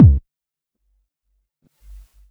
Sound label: Quasimidi 309, Drums